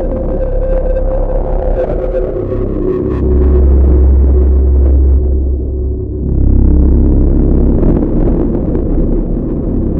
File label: Battle Silent; let it die